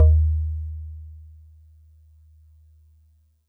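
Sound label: Celeste DX100 D#1; Pristine Celeste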